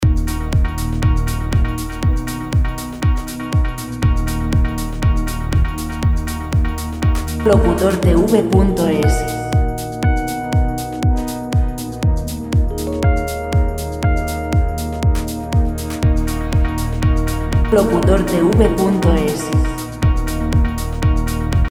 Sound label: 120 pop; Música Pop sin copyright